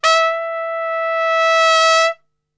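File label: E 3 trumpet; Best Service; Brass Super Section; TRP SWL; 90 s Sample CDs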